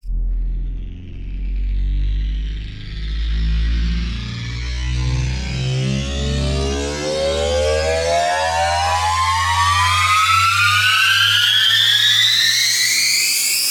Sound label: Riddim Riser, Destroy; One Shots; Ghosthack; Riddim Essentials 2020